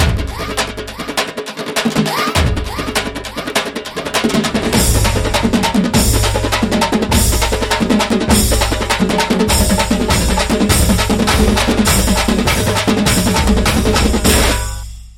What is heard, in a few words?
Kuthu